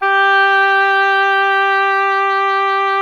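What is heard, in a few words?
oboe, wind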